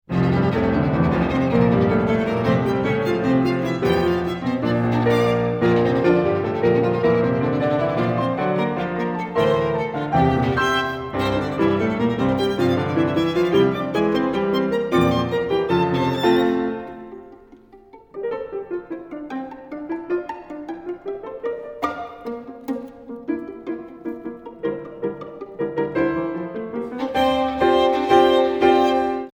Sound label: Violin Sonata; PROKOFIEV; No. 1 in F minor Op. 80